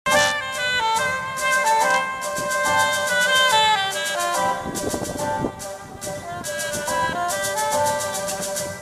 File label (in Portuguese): sax e o bolero de ravel